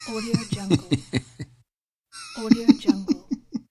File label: laughter